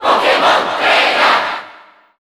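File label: Cheer Japanese, crowd; Pokémon Trainer; SSBU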